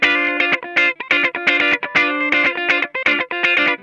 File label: D PEDAL, Funk Guitar; 90 s Sample CDs; Zero G